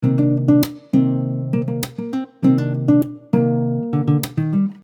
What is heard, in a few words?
Percussive Cmin 100bpm, Soft Nylon Guitar; MG Soft Nylon Guitar - MIDI Pack